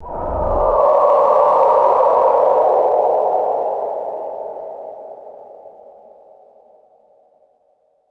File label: eerie ethereal ghostly wail swell; designed